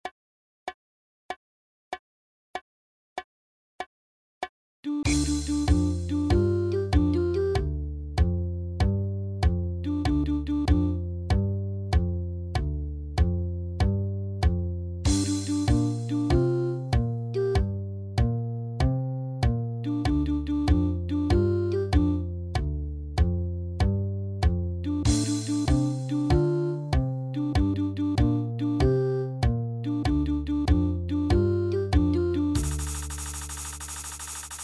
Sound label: short riff